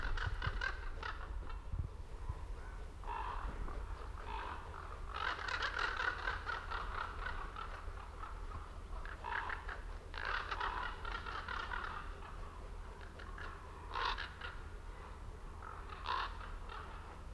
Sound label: Northern Raven Corvus corax